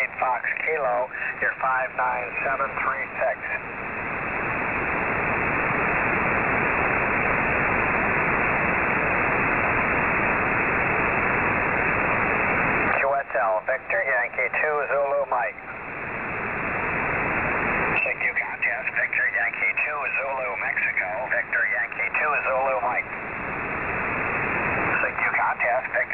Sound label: 14142.9kHz; web sdr